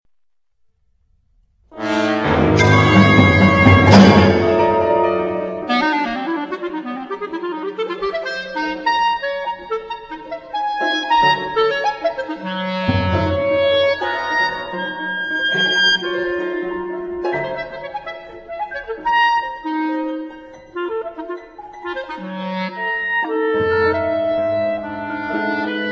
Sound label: Clarinet Concerto